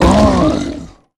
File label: Rock Monster Hurt Growl; Mars Sortie